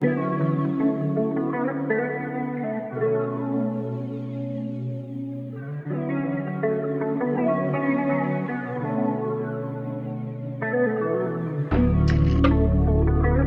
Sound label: Forest River; chill